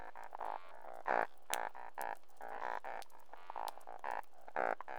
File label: Fish calls; WWF